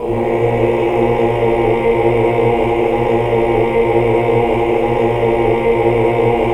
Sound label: AAH